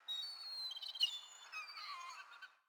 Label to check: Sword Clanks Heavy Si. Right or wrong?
Wrong